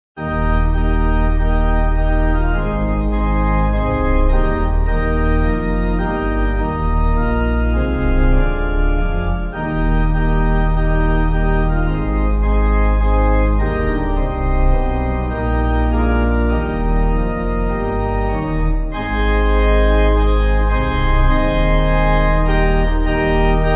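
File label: Pipe Organ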